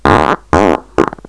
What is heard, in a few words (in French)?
triple, prout